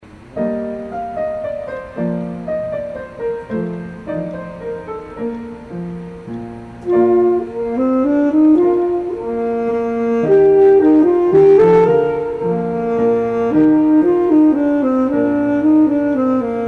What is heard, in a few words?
sax; bach